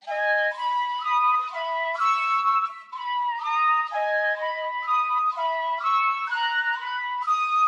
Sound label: Melody Loop - 125 BPM G# Min Flute; Melodies; Loop Stems; Cymatics; Oracle Sample Pack; Oracle Various Melody Loop 3